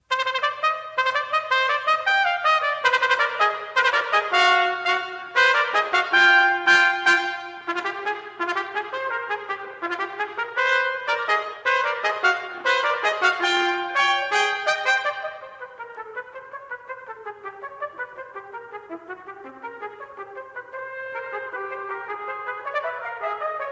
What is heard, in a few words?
Two Trumpets; Fanfare; CRYSTAL RECORDS